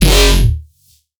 Riddim Bass Hit F#; One-Shots; Bass Hits; Tronics; Ghosthack; Riddim Essentials Volume 2

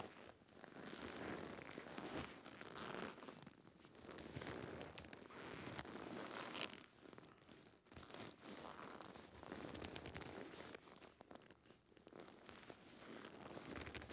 Car Seat Interior Movement

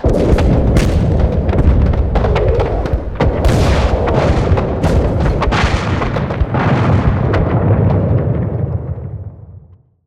Explosion; Explosion Series

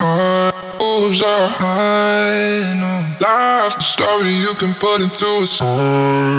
Vocal Chop 150 BPM F# Min; Cymatics; Euphoria Vocal Sample Pack

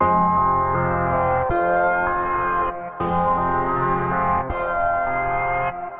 Dust Organ Fm 80Bpm, Lo-Fi; Granular Musical Loops; Ghosthack Advent Calendar; Lo-Fi Kit

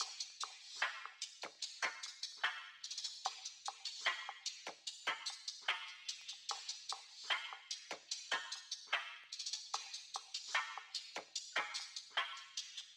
Drum Loop 148 BPM Percussion; Loop Stems; Cymatics; DOPE DRUMS VOL. 1; Friday Drum Loop

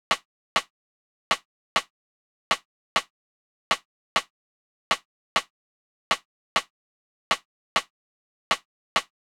Drum Loop 100 BPM Percussion, Hip Hop; Drum Loops; Loop Stems; Cymatics; No Se Nada

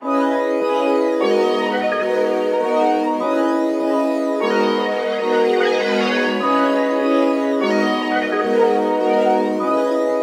150 BPM G# Min; Nebula; Wavy

